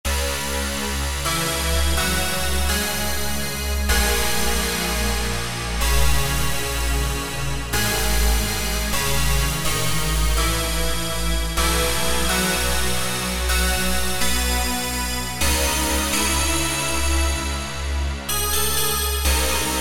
SYNTH TEXTURES; Berneray Chill; Apple GarageBand 2

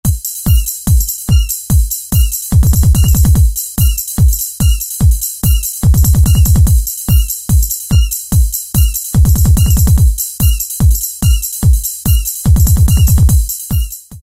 Trance Pattern Break, Roland MC-303

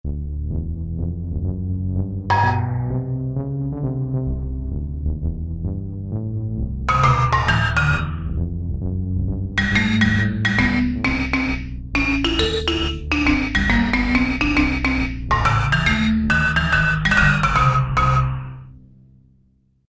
Korg MSC-1S Solid Wood; Korg MSC-1S Variety

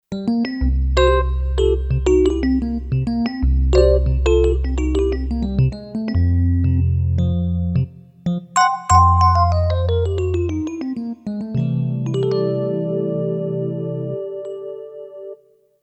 Dyno E Piano, Kurzweil K2500X; Factory Programs

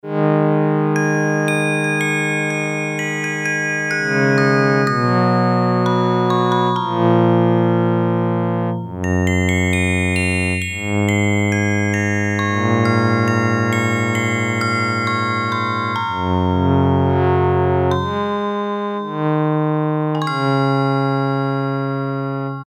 STRG-CHIME, Yamaha DX7

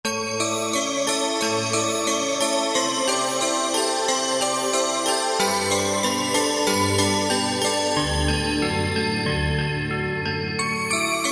Adventure Bells; Korg TR-Rack

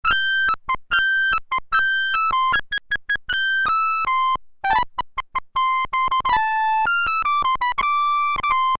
Sharp Reed, Roland Juno-106; Factory Preset

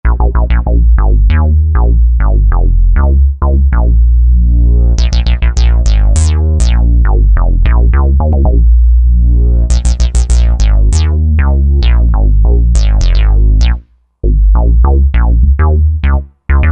HI-Ring Bass, Roland JV-2080

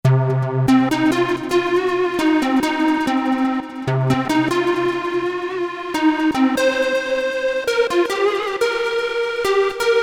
Prophecy Lead; Novation XioSynth 25

